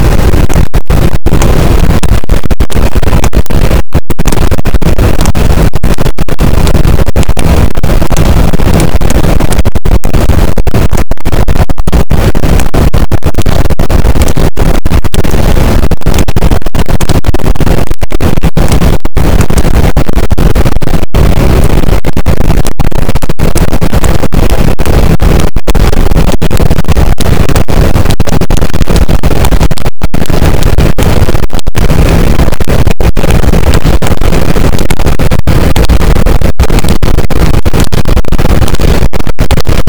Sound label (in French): cachalot positif, boat; Physeter Macrocephalus